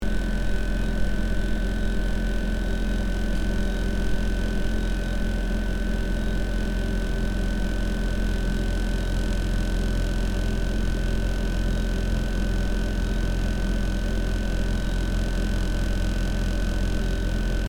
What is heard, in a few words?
Small kitchen refrigerator hum loop